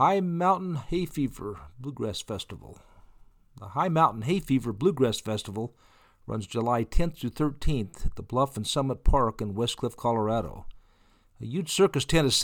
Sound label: high mountain hayfever bluegrass festival